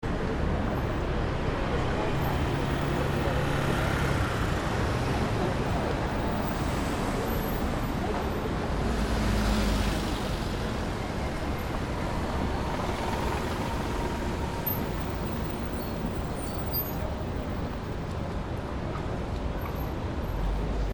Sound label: crowded street